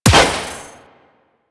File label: Pistol Impact Generic; Sentient; Crp Sent Amalg Pistol